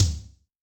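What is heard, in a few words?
bass drum room, Kick, Yamaha DTXpress-II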